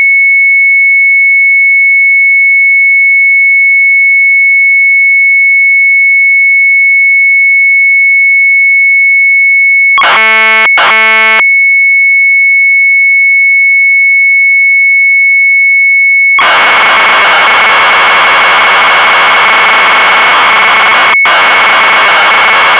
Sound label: MZ800 Tape, sharp; PLOTTERTEKENING; retromelsarchive